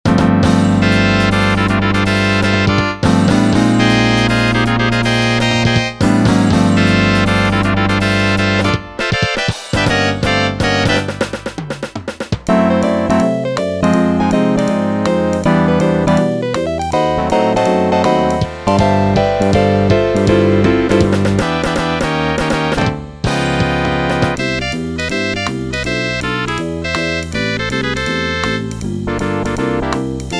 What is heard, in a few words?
yamaha pss 780 factory demo